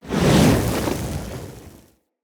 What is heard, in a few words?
Torch Whoosh; Weapons; Enemy; Torches; UC Torch